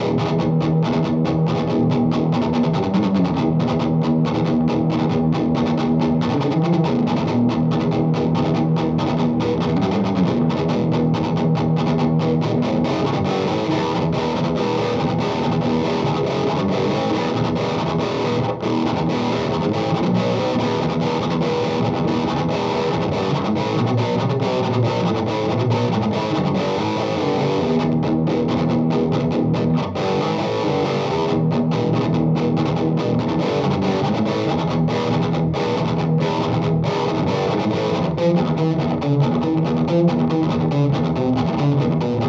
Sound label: Chime; Strymon Iridium